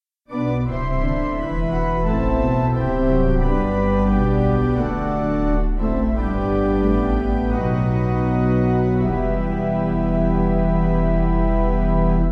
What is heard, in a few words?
Pipe